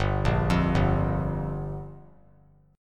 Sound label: G Minor 7th; Gm7 arpeggiated; JGuitar